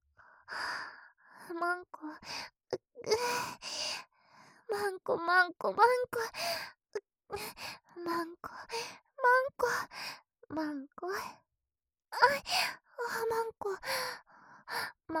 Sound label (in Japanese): 喘ぎ; まんこ; ASMR